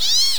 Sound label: spider die; Giza 2.0